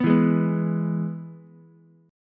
E-Major E, Ukulele; strummed; JGuitar

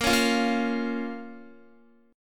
A sharp Minor A sharp down Guitar; A#m; strummed

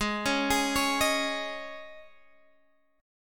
G sharp Suspended 4th Guitar Drop D chord, down

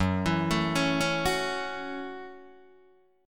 F sharp Minor 7th F sharp, Guitar DADGAD

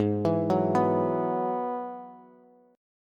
Ab Minor 7th Sharp 5th Ab, down, Bass; arpeggiated; JGuitar

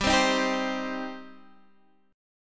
G Suspended 4th Sharp 5th, Guitar, Half Step Down

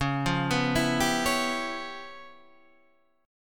Db 7th Sharp 9th Flat 5th, Guitar, Standard; Db7#9b5 arpeggiated; JGuitar

